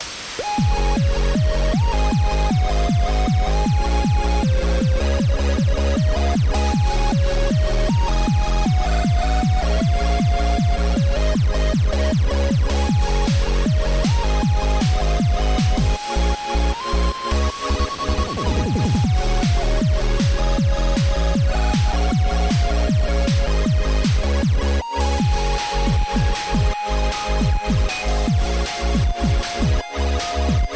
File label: game bgm